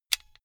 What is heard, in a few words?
car flip key insert hard